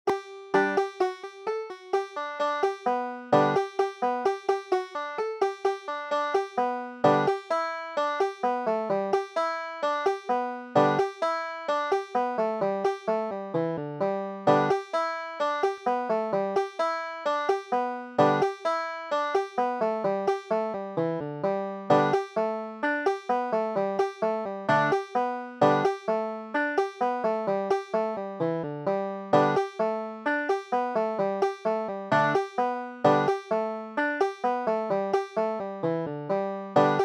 banjo

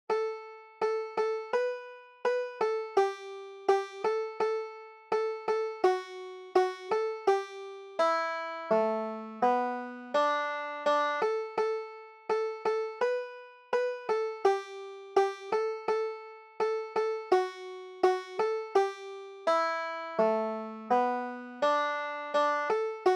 old molly hare, banjo

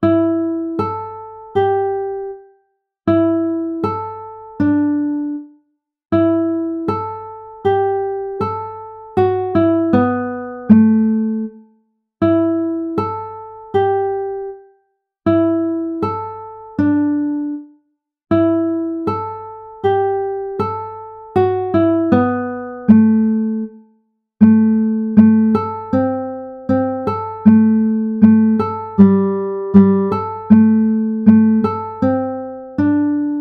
banjo

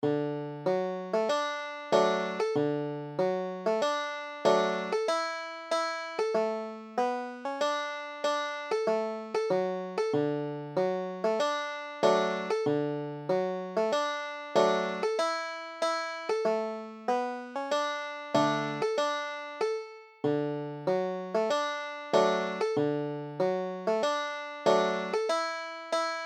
schottische, banjo

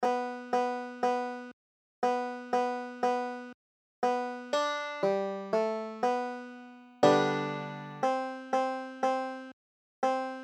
banjo

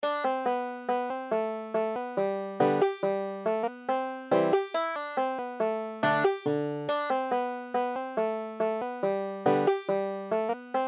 banjo

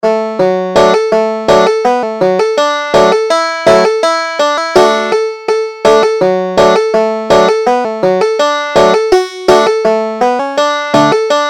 few days, banjo

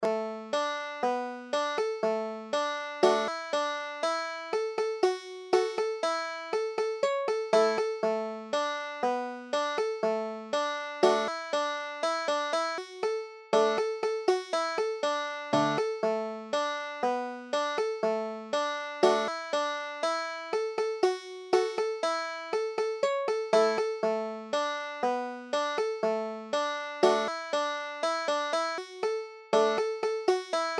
sarah armstrong, banjo

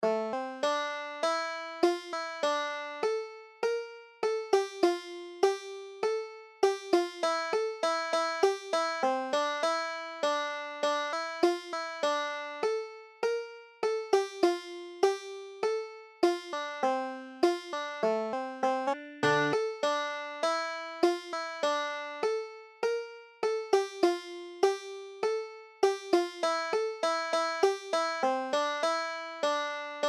bonnie prince charlie, banjo

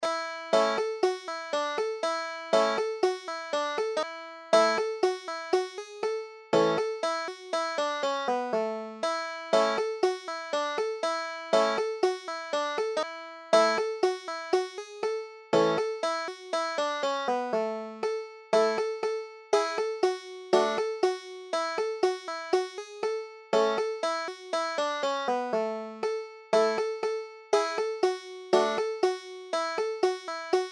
chinquapin hunting, banjo

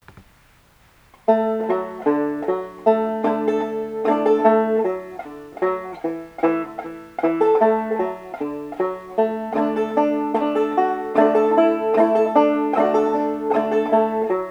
soldiers joy, banjo

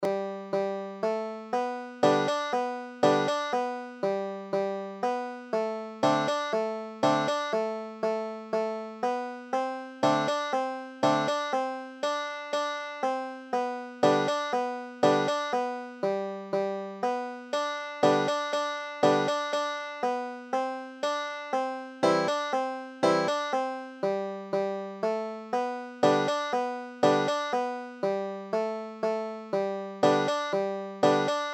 banks of the ohio, banjo

